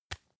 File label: tissue tap soft